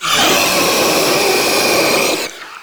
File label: Proto Infested Ancient Heavy Bark, Enemies Vocals